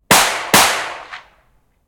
Steyr TMP 9mm 30m in front off axis clean double shots; fvtt sonniss gdc audio